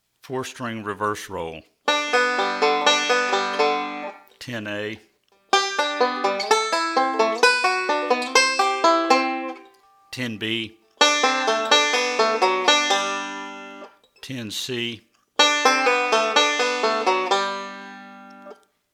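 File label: Four-String Backward Roll, banjo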